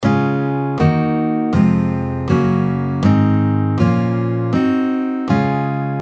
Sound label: C Major Chord Scale, guitar